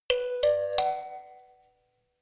notification kalimba rattle triad up minor high

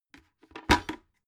bucket put down hard

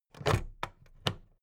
plastic clasp open heavy

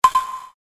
short ambient impact event

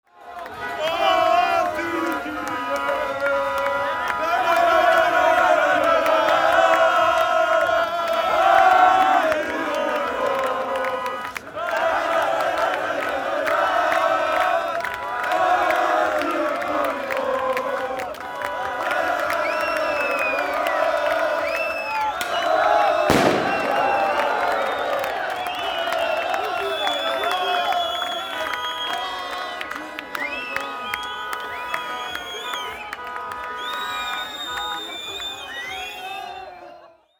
football fans

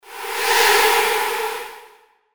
hf sweep

